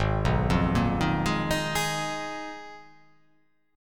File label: G sharp Minor 6th Add 9th